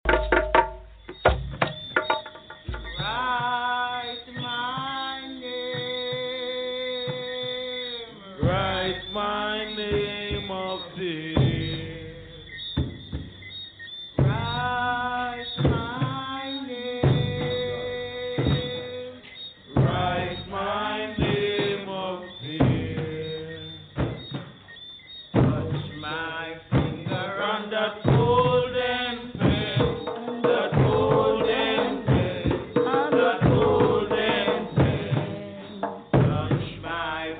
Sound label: rasta nyabinghi chanting; coronavirus cleaning earth for evil in music; caribbeanradioshow